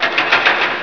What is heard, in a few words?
DOOR; ARMRCMD